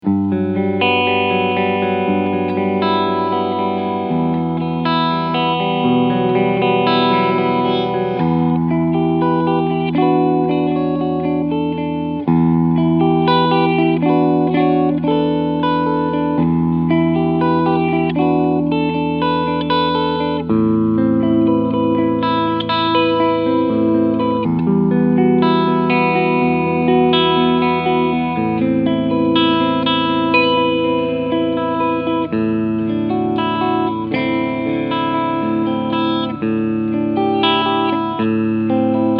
Framus Artist Line Phil XG Clean Sound beide Pickups